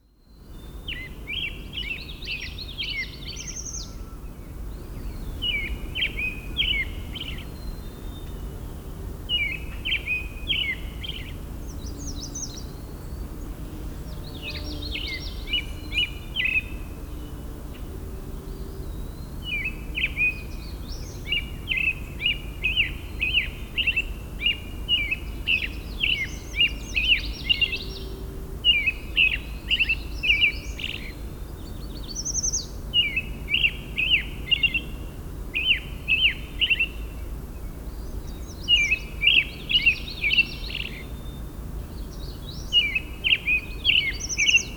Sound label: American robin; rattlesnake hill